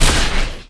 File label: Fire Arrow Exp